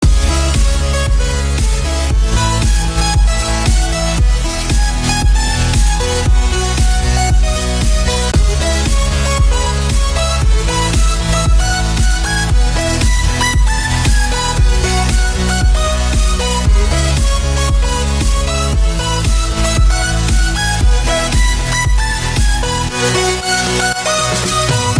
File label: mobile ringtone